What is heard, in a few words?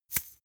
chain mail impact soft